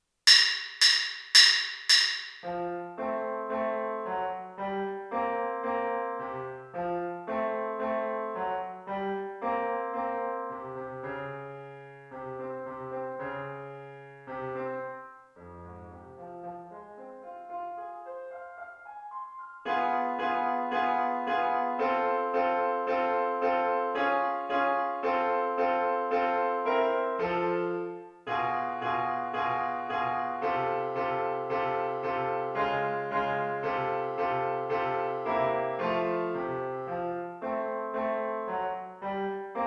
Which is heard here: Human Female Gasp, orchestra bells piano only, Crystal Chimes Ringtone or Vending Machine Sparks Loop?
orchestra bells piano only